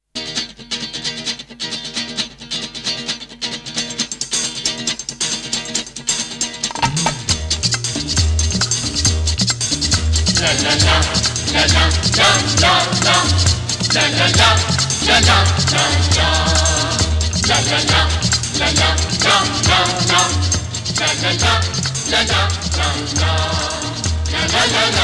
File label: Instrumental